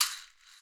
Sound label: SHAKKA, Ethno Techno; ILIO - Ethno Techno